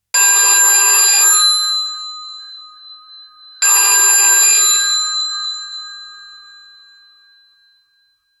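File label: retro, 80s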